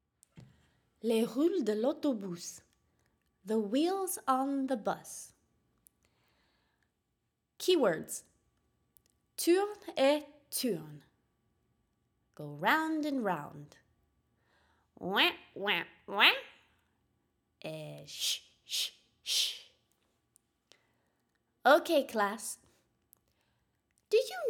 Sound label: The Wheels On The Bus French; Bilingual Birdies